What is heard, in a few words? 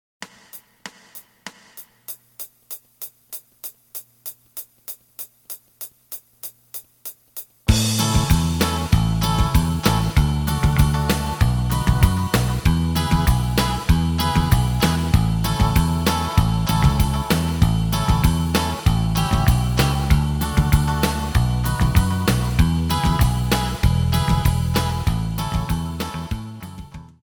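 lead guitar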